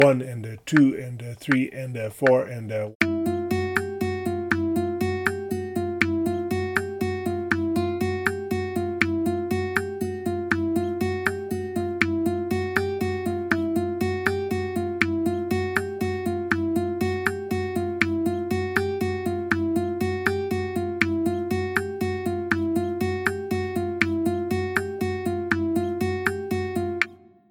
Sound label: Triplets; Beyond Chord Melody Volume II